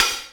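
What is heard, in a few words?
Closed Hi-Hat, Dance Drums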